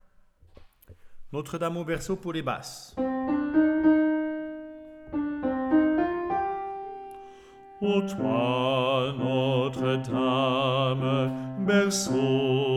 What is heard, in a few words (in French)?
Chants Notre Dame au berceau B